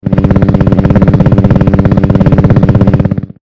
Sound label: propeller